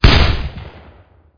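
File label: Assault Rifle; CastleMiner Z; Xbox 360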